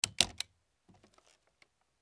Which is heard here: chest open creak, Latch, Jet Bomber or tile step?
Latch